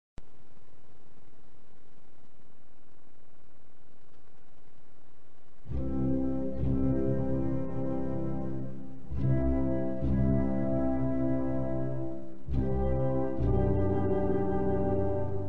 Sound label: National anthem of Republic of China (Taiw; Himno nacional de República de China (Taiwán)